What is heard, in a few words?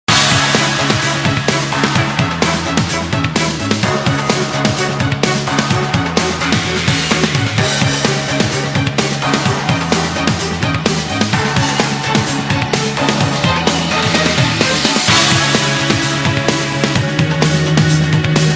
STRING BUILD; Bourne